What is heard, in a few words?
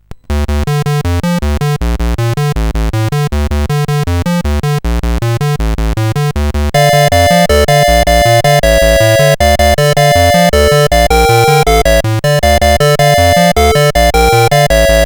Music sid CSG8580R5